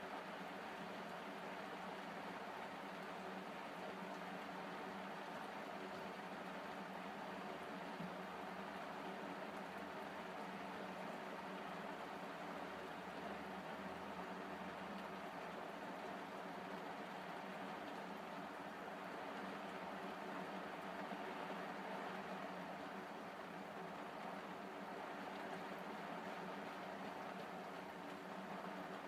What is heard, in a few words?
sound shingle